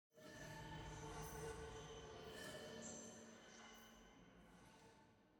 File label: Pad High; Frozen Flame; windows